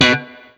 BLU POP, Guitar & Bass; 90 s Sample CDs; Quantum Leap - Guitar & Bass

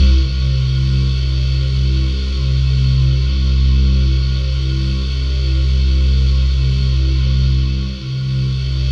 C2, Synth Leads

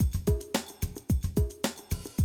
110, Classic Drum Loops

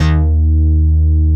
PPG BASS; BASS SOUNDS

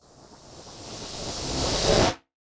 Reverse Fx; musicradar

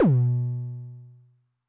BD MOD Pitch High, bass drum, 909; classic machines; 909mod

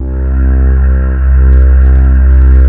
NEW AGE VOX, JV PADS; Pro-Rec - Synthsonic

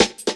Drum Loops, 165.5; Jungle; Zero-G Jungle Warfare 1, 2 & 3

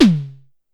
Drum X Tom, Pearl

